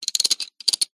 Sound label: office pencils artists in metal tin roll hand over movement; zapsplat